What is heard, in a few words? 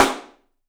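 PICOLO SNRS, snare, drums; 3D Sound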